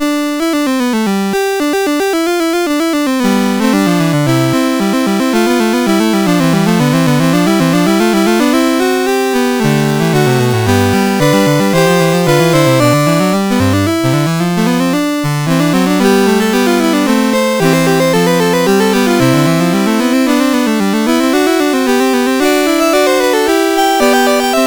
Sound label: Fugue, CSG8580R5; Fugue VIII 5